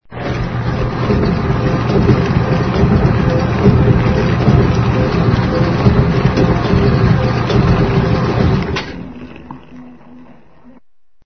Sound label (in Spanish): Industria fabrica